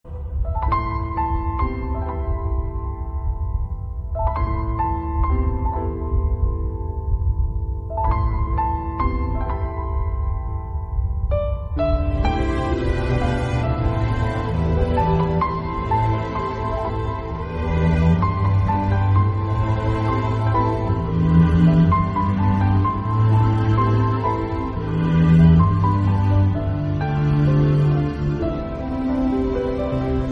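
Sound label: sad background music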